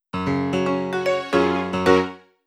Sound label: Jingle Recovering Physical Condition